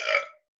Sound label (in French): mdr cartoon